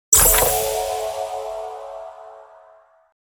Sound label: Chest open reward; gfxsounds